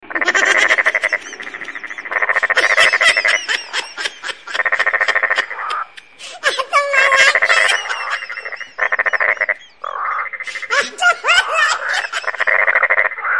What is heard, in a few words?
funny sounds